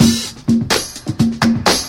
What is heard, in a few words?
congas and claps, funky drum loops